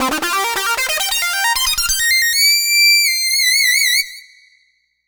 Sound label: Lead Synth Lick; Riffs & Solos; Dr. Fink's Funk Factory